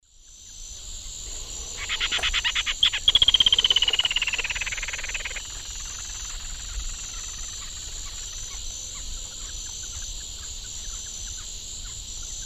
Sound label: Laterallus exilis, song; S. America; Eastern Lowlands